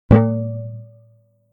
Electric guitar stuck string

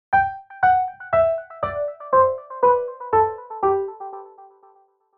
Synth and piano G major descending scale; gfxsounds

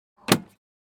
Airplane interior overhead bin close; vehicle

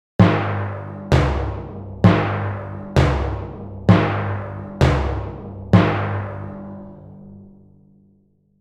Cartoon timpani drum slow hits; drums and percussion

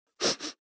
Human smelling inhaling

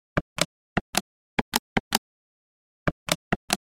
Sharp EL 8 old calculator press buttons; gfxsounds

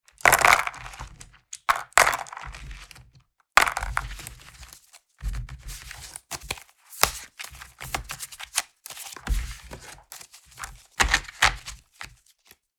Board game box packing up pieces; leisure

